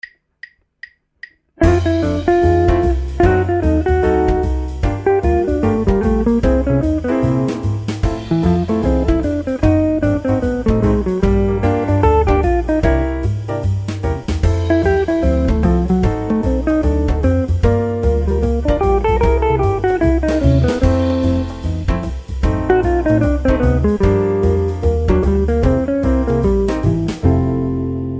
Improvising With Diminished Scales Example

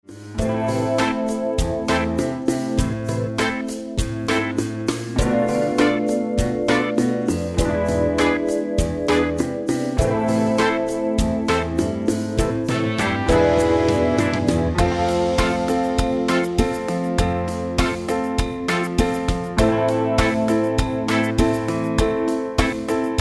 Bass Latin Groove

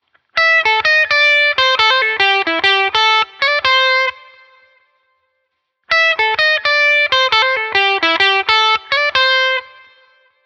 Blues Lick

